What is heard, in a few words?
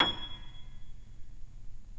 PNO PP; Synclavier Sampler Library